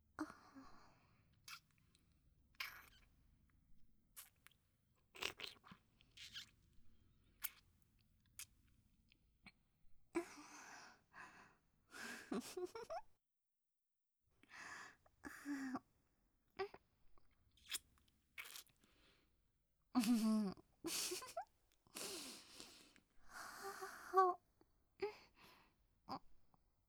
キス; 【マゾハーレム】

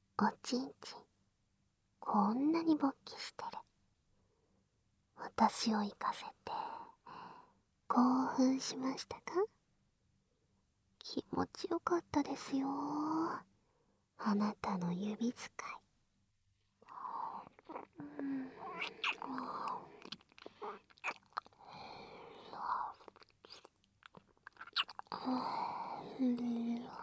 フェラ、分身脳バグ耳舐め, ASMR; ささやき庵 夢神楽幻想 夢衣

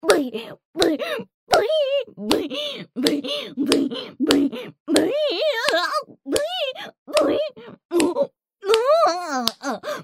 ブヒ声喘ぎループ（パンパンSE付）

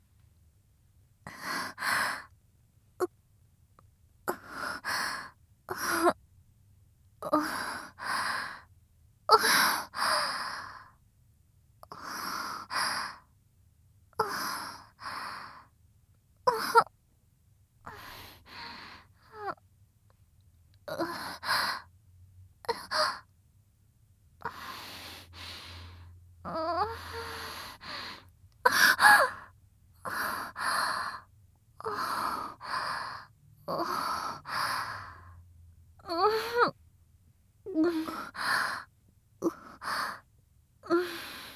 喘ぎ声 清純; 喘ぎ声A(清純); 清楚ビッチエルフ姫のメガ盛りマントロフルコース

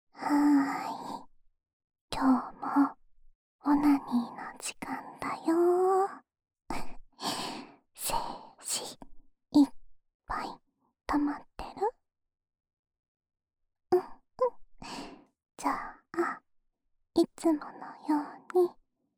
オナサポ用耳舐めループ（右耳）, ASMR; おま○こ係１

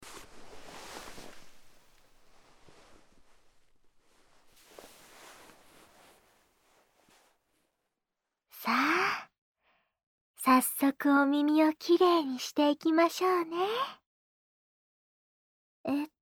梵天耳かきで左耳の耳掃除です！

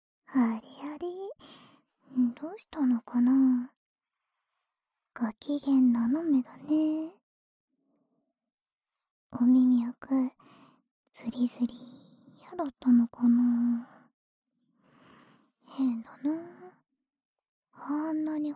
左 耳ふー, ASMR

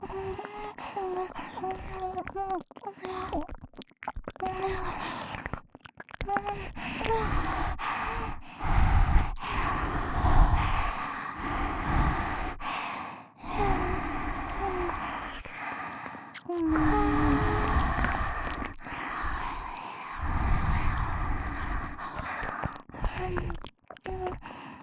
両耳元くちゅくちゅハァハァ; ソフィ

